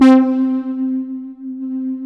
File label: SYNTH X LD, TECHNO; Pro-Rec - Synthsonic; Z1 TECHNO